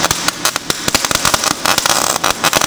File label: sparks